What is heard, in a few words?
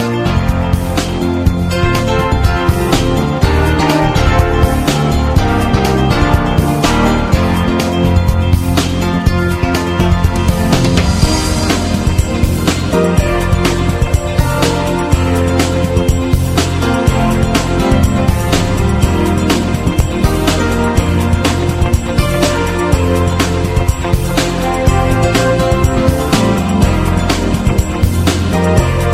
EASY ROCK